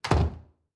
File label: Door Close; MiSide